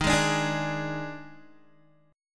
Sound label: Eb Major 7th Suspended 4th Eb, Guitar; strummed; 11,11,12,13,14,x; EbM7sus4